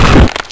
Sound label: hornet hit, monsters; AoMClassic